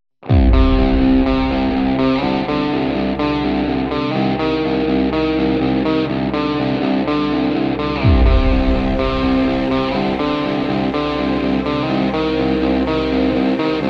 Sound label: underscore